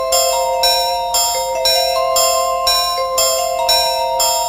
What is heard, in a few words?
Alarm